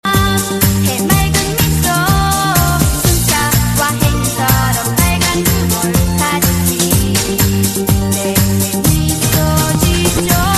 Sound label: anime ringtone